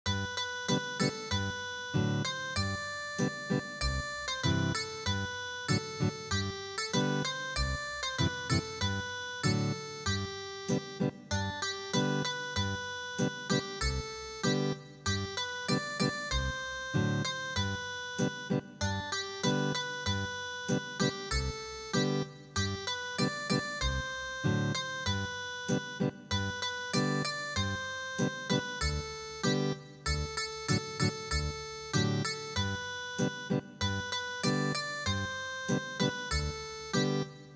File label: kono sake natsukashi Ethnic Guitar